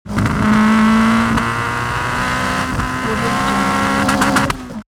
transportation car opel kadett acceleration sputter